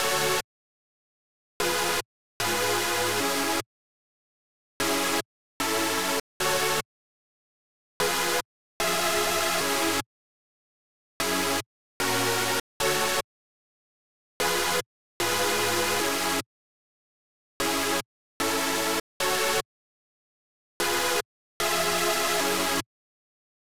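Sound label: 150 BPM F# Minor Chords, Future Bass; Melodies; Loop Stems; Cymatics; GHOST (Beta Pack); Believer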